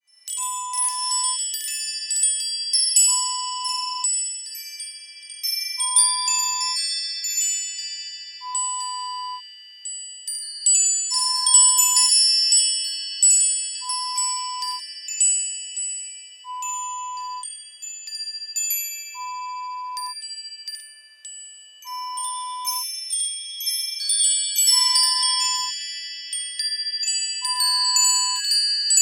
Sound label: Wind Chimes sound